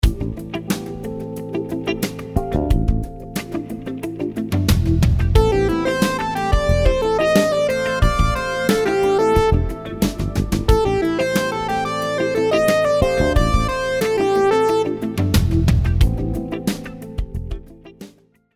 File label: rhythms 16s syncopated